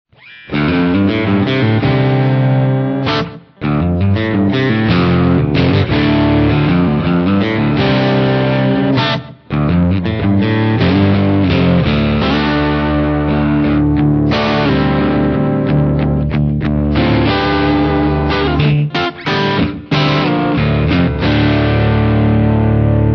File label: Dualtone Humb, guitar